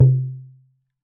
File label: Talking Drum; Percussion Samples